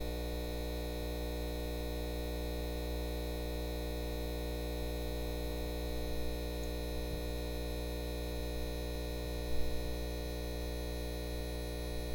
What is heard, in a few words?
Technology Buzz